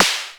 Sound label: Snaredrum, Roland System-100; Drum Samples